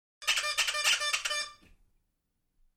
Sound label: Squeaky Toy